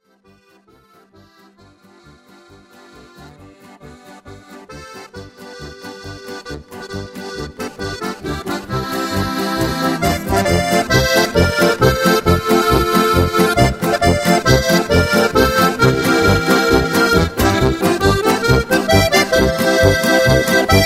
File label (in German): schlawiner polka